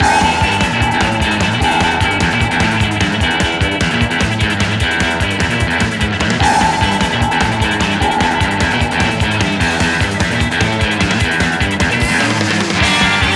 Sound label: Music - Race Loop; Deepseadriving